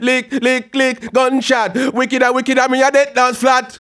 HAL RAGGAM, RAGGA-MUFF A maj

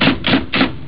tak tak tak; ST-XX Amiga Soundtracker Sample Packs

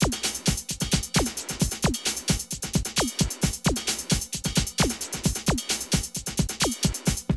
Drum loops, Rave & Hardcore

